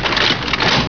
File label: gear go; funny; Wired for Sound Pro CD; Aristosoft, Inc.